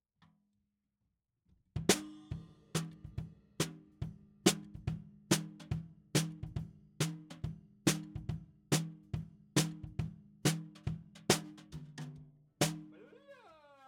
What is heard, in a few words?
SNR BTM